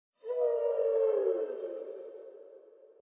Dino Distant; docks

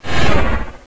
pit drone communicate; svencoop; sectore

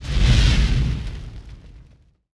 push

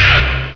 trigger jump

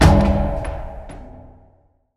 counter secret

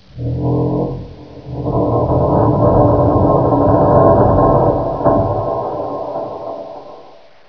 groan; dm borehole

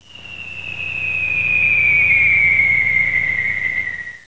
bomb fall

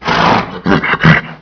horsey

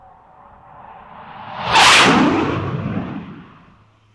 jet; monorail

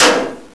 metal

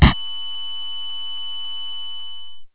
deaf sound

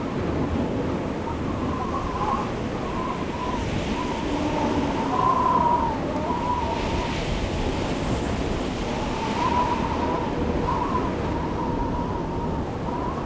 arctic wind; iceworld

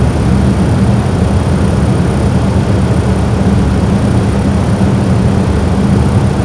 ventilation; svencoop; zombie nights

